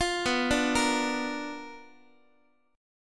B Minor Major 7th Flat 5th Guitar; arpeggiated; JGuitar

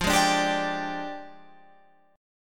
F Minor 6th Add 9th F down Guitar Open F; strummed

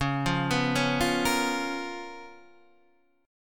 Db 13th Db; arpeggiated; JGuitar

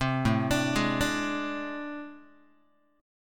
A Minor Major 7th Double Flat 5th A, Guitar; AmM7bb5 arpeggiated; 4A,4E,5A,5D,5G#,6C#; 3,5,5,6,6,x

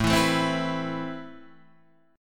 A-Minor Add 9th A; strummed; JGuitar

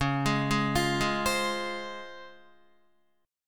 C sharp Major 7th, Guitar; arpeggiated; chord

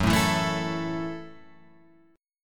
F sharp Minor 7th F sharp, Guitar Open F; strummed; JGuitar

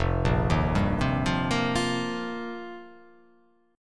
F 7th Suspended 2nd Suspended 4th

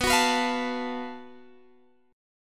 F sharp Minor Major 7th Double Flat 5th B

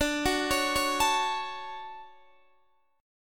D Major 7th, D, down, Guitar DADGAD

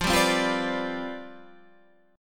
C Major 7th Suspended 2nd Suspended 4th F, chord

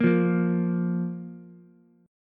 A sharp 5th A sharp, Ukulele; strummed; JGuitar

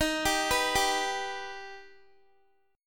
D sharp Augmented D sharp Guitar; D#+ arpeggiated; x,18,19,16,19,x

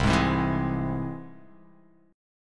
C Augmented down

